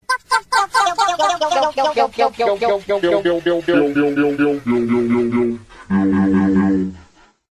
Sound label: tilt sound